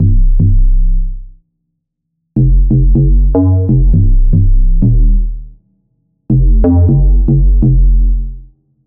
Underground FM, bass synth; Wave Alchemy - Bassynth 1.1.0

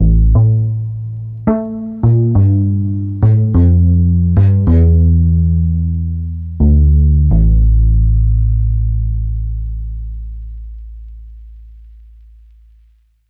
Distant Pizz, bass synth; Wave Alchemy - Bassynth 1.1.0

